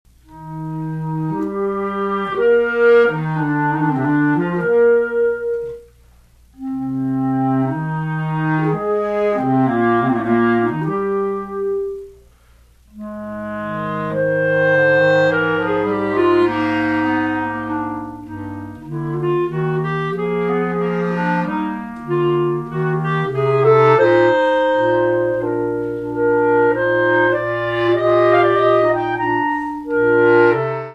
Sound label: Quartet; Crusell